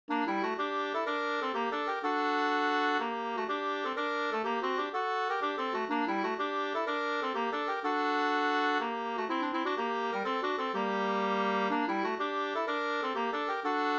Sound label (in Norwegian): pols; e Hans Brimi no 2st V1